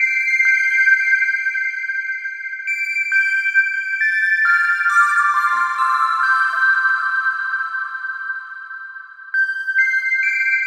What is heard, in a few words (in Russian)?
Ice Palace, фоновый звук